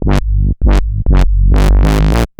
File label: Roland A Line, Roland S100; musicradar-synth-samples